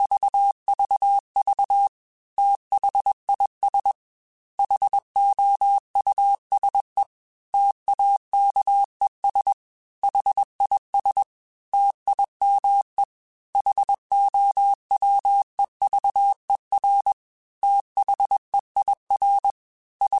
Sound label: T then H Runs, Morse Code; CW Code Groups; Mixed; Single T or H; HamRadio; Prefixes